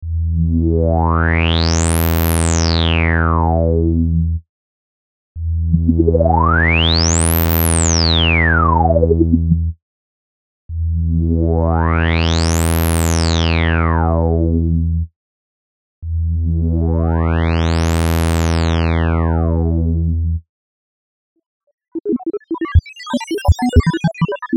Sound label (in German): Resonanzcharakteristiken